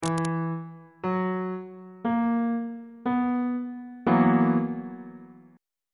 b major 7th suspended 4th 1st inversion on bass clef